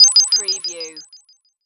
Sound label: Multimedia Beeps; sound-effects-bible